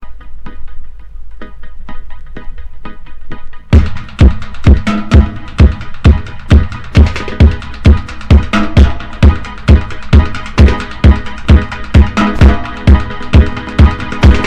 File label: electric guitars beat me hollow